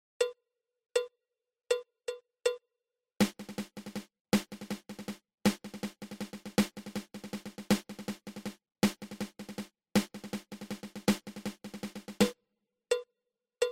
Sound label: Double Ratamacue